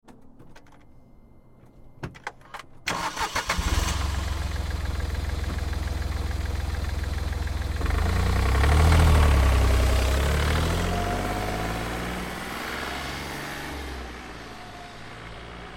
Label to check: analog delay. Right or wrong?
Wrong